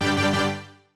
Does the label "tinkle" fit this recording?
No